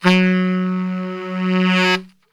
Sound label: F#1 sax